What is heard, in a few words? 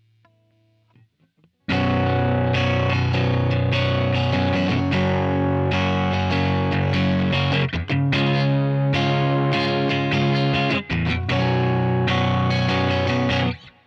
quilter squire